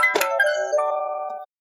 hook target; weapons; persona; dota; pudge

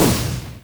range projectile impact; weapons; dota; creep

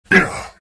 pain, vo; arc warden

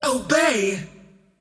attack whip; queenofpain

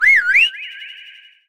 special delivery cast; dota; marci

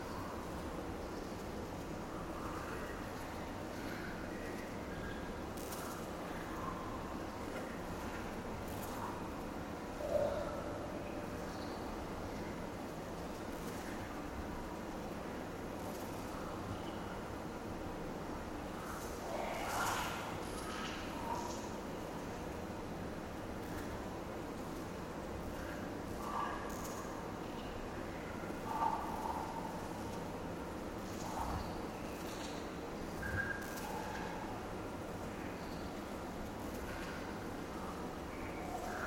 day, cavern; ambient soundscapes; dota

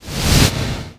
preattack; dota; morphling

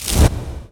preattack; dota; lina

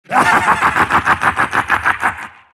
silt laugh; dota; siltbreaker

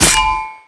ring, large blade; weapons; dota

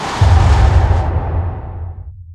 starstorm impact; weapons; dota; mirana

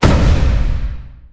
chaos bolt target; weapons; dota; chaos knight

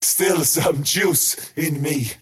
skywrath crown cast pain; skywrath mage

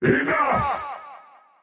attack pain; dota; faceless void

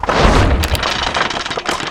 engine attack; weapons; dota; creep

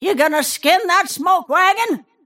attack pain; snapfire

